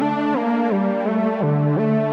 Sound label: cry loop